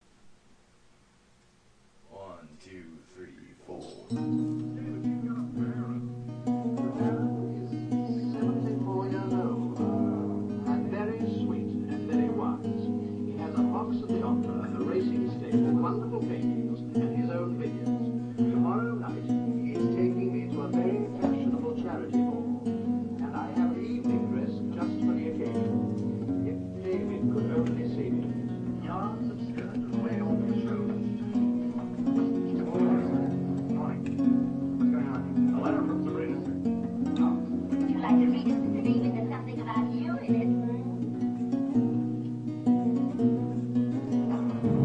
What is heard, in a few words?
old fashioned radio and guitar